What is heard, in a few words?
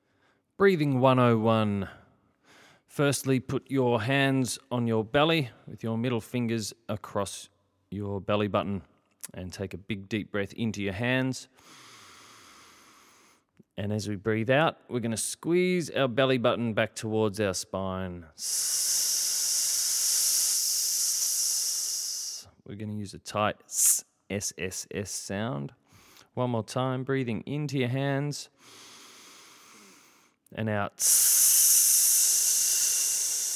breathing, singing